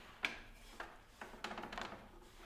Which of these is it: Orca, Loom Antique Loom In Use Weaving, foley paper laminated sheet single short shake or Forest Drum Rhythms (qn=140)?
Loom Antique Loom In Use Weaving